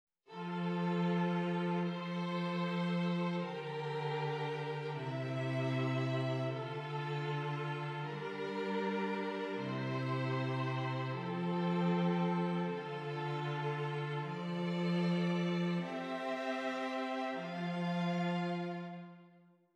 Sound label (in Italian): esercizi di contrappunto semplice A tre voci; Ronge